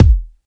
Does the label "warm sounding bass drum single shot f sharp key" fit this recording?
Yes